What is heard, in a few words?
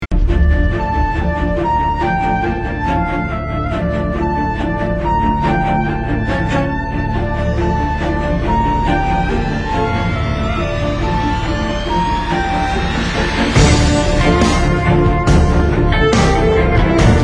theme music ringtone